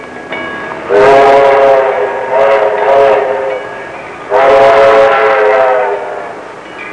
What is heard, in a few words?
trn wh, transportation